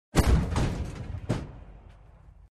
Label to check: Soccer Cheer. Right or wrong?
Wrong